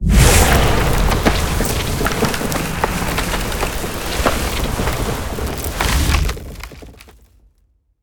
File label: lobby portal long; smite; hera